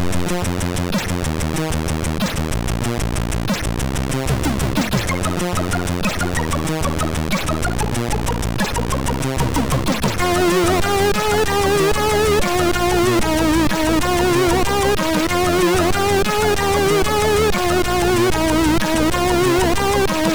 Beatsystem; MOS6581R2

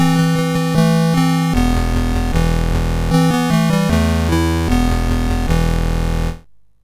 sid MOS6581R4; Movie Monster Game

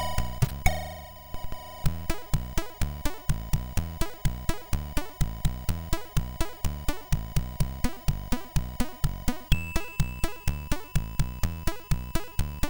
sid MOS6581R2

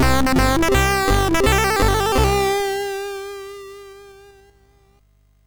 sid MOS6581R3; Garfield

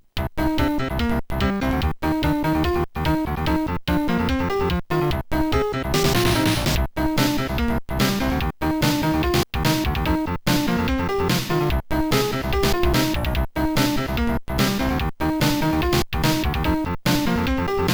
Pop; Music Appetizer; A500

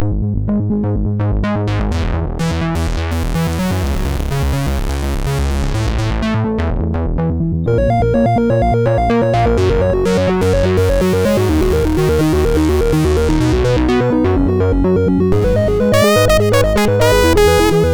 sid MOS6581R2

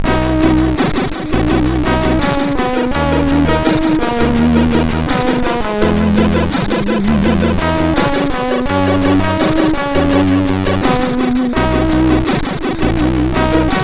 Game Over, MOS6581; MOS6581R4

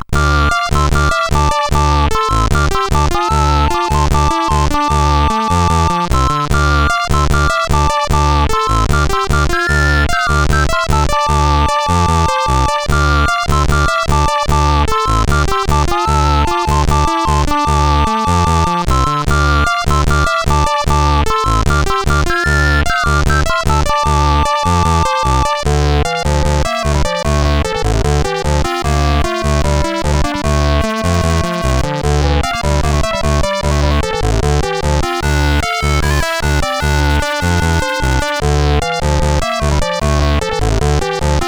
Soft, CSG8580; CSG8580R5

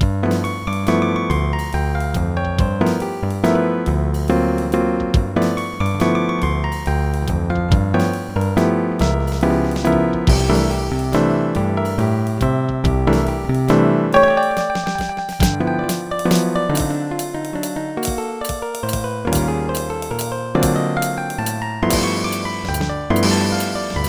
solo; Dr. T's MIDI Recording Studio

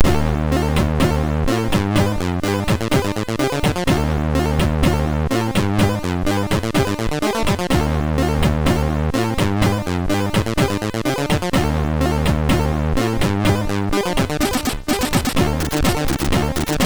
sid MOS6581R2

